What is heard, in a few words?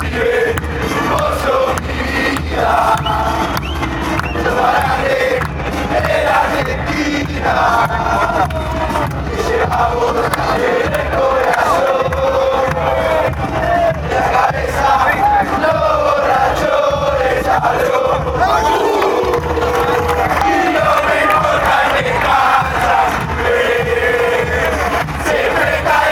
fanchants; river; your my life